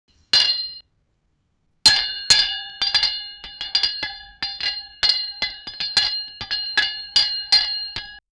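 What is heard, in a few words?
soccerball hitting a metal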